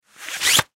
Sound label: Nylon Case Object Insert Slide Sleeve; Objects; Blastwave; Foley